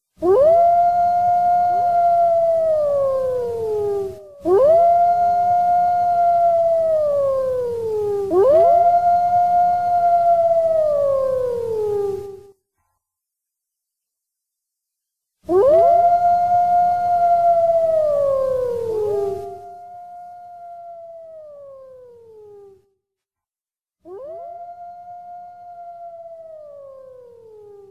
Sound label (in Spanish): Sonido Lobo